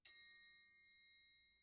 triangle hit mp

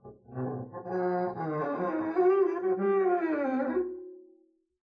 arco noise creak long, double bass; dsmolken; noises

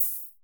808 Hat Open; hydrogen drum samples

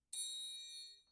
triangle hit mp muted; Idiophones; Struck Idiophones; Triangles

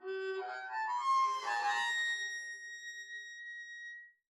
arco noise harmonics, double bass; dsmolken; noises

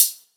hh closed, 808 mini, drum machines

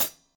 Hat Closed Soft; hydrogen drum samples

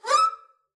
arco noise high short, double bass; dsmolken; noises

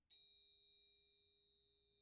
triangle hit mp

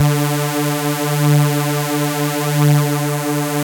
Super Saw D3

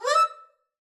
arco noise high short, double bass; dsmolken; noises

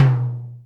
drum tom mid soft

